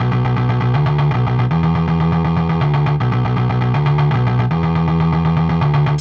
Hardcore Breakbeat, 160 Bmin; Big Fish Audio - Hardcore Breakbeat Action

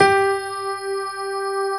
GRAND PF G 3, AKAI S-Series; AKAI S-Series CD-ROM Sound Library VOL-7; D.GRAND PF

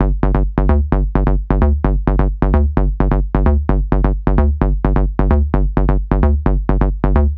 NRG Bass, Trance

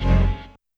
Giant Stab, YAMAHA RM1X

